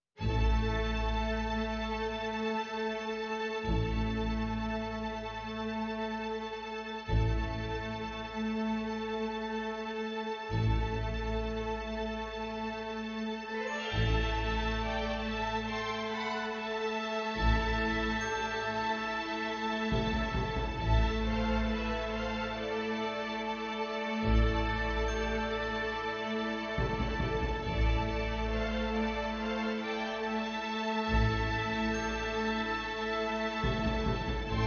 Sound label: String Orchestra; Gaudete